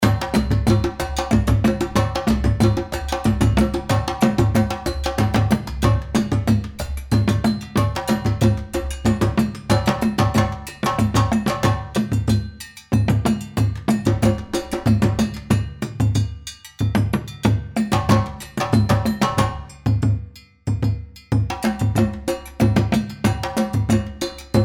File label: West Africa Ensemble Sabar